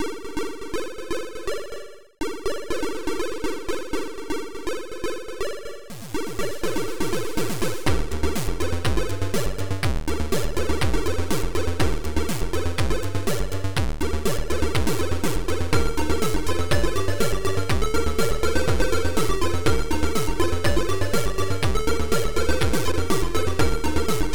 Game level; Lost Donation Box Incident

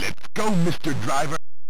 Title AY Beeper; Chase HQ

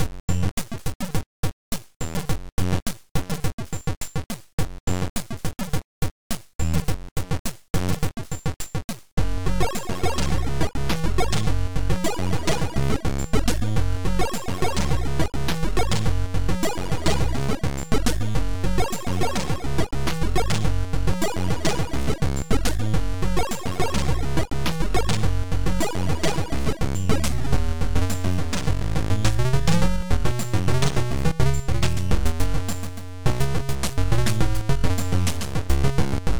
Jazz

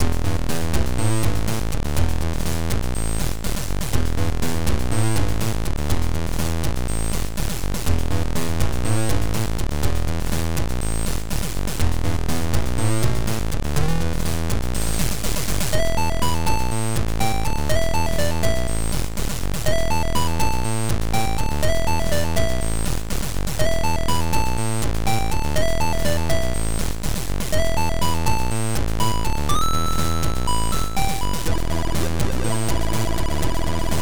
jazz

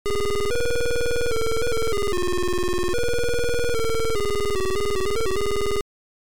Intro Beeper; ZX; Soft Wizard

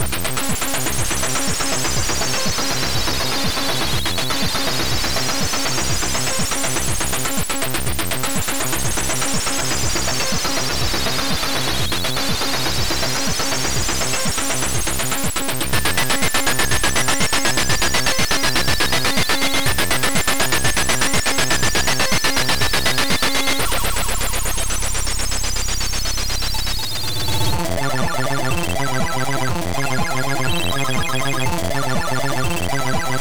Trance

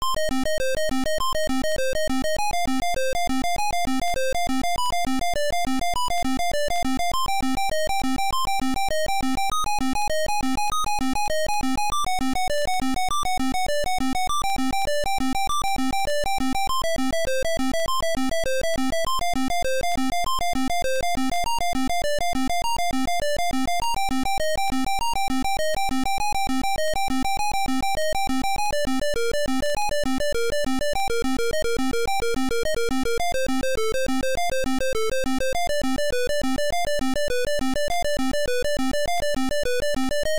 Title Beeper; Ian Piumarta; Sir Lancelot

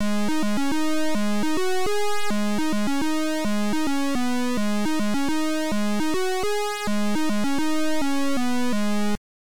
Title Beeper; Star Quake

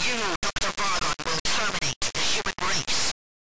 Title Beeper; Ice Cool Coders 1999; digital beeper

